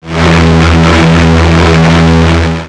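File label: Laser Fire Exterior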